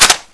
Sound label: AK47 Clip Out; weapons; AK47-Beast